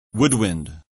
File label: woodwind